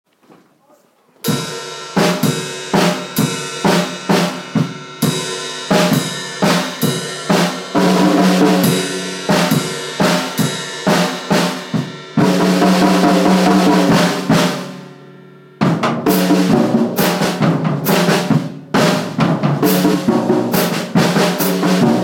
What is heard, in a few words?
drum solo